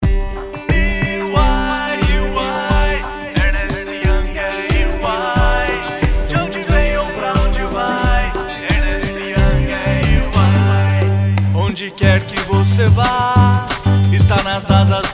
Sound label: JINGLE; ERNST YOUNG